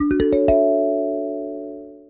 kalimba pizz

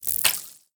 skill slip

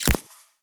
p atk krossbow

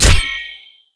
flag sword

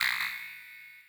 ui door alarm

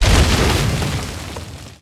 fanfire

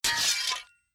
unsheathe

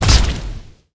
atk fall explode

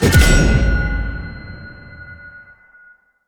lantern soul sword

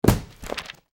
book drop

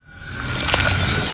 skill icy cone appear

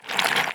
e imp stone seal

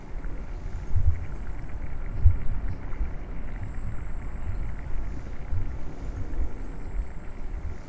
hydrargyrum fluid

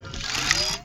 p atk harpbow cast

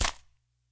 red flash

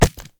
skill nightmonster disappear

